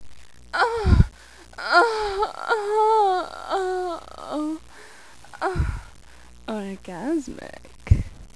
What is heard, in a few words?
orgasmic